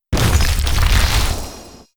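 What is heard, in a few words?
ice lord spawn death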